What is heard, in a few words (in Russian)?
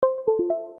windows zvuk podkljuchenija ustrojstva